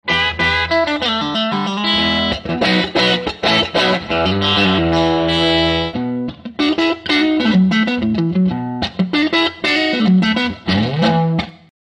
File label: Fender A Tele, guitar sounds